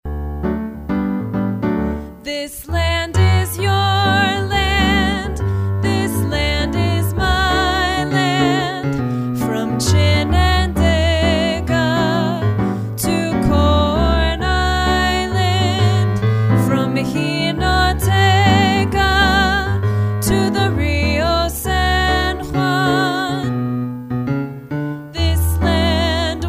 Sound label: vocal; PSE Music